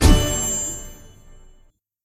shield Sound; BattleBuddies

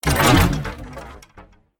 crank Sound; DragonDrop

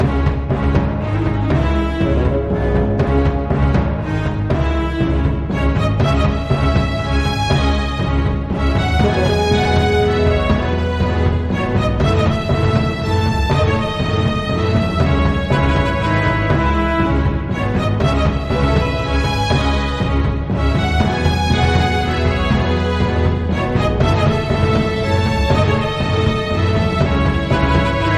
Battle Music; SoundTracks; BattleBuddies

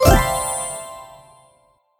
clear Row Sound; FractionStrips

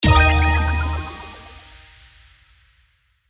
Buff Sound; BattleBuddies

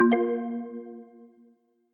grab Sound; SpacePetsSkipCounting

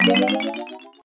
upgrade; MQ2

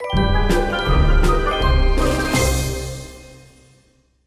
defeated; MQ2

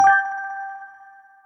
prize Sound; BattleBuddies

